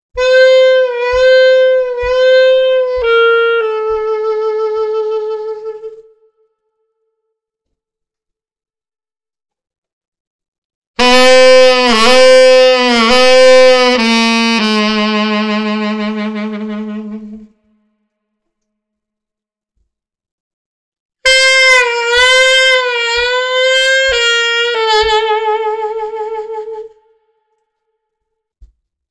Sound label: Lip Slurs